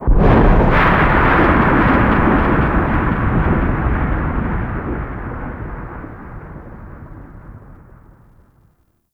Environmental Booms E; Ambience; Warframe; Man In The Wall

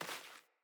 Sandals Walk Vegetation, Footsteps

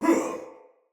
Pain Soldier Male; Battle; Barks; Dialog; Sinecure Soldier Male B

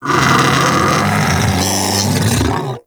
Vocal Alerted; Hunting Critters; Undazoa

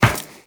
Run Metal Perforated, Footsteps; Warframe; Tenno

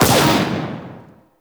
Mortar Launch; Warframe; Raptor

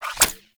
Pump Shotgun Reload Shell E; Weapons; Warframe; Zariman

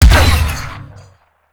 Artillery Shot; Warframe; Nechro Mech; Abilities

